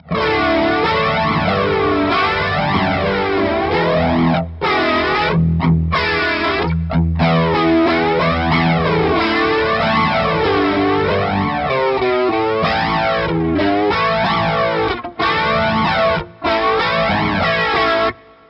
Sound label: overdrive, electric guitar; Demo overdrive + MissTress